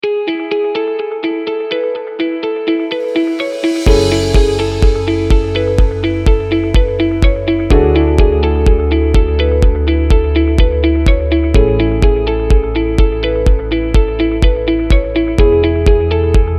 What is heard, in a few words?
voice over music